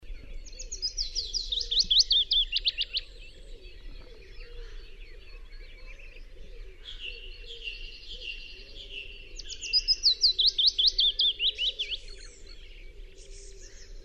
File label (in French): Pouillot fitis, chant